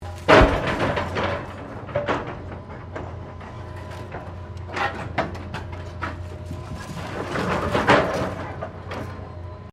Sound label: Construction mechanical claw movement; Various construction machinery; SoundEffects Foundation2